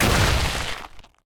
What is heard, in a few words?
best step into